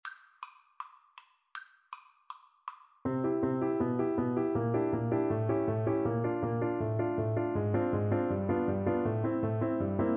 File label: Finale for Oboe